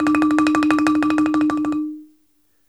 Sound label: BALAFON D; E-MU Producer Series Vol. 7 – Old World Instruments